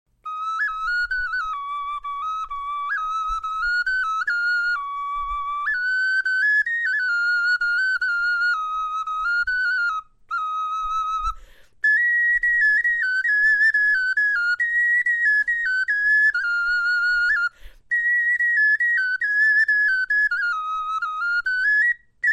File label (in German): melodie, knochenfloeten